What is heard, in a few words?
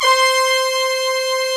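brass tutti, Brass Tutti octave; Optical Media International - Sonic Images Library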